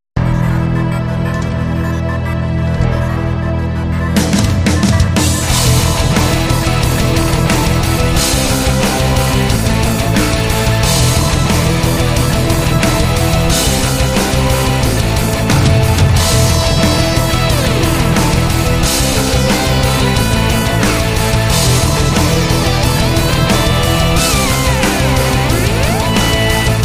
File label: Action Anthem, No Vocals